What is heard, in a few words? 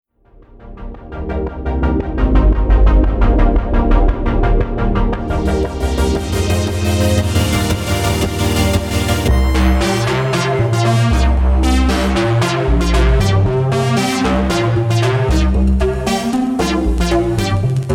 Classic Analog Expansion; kontakt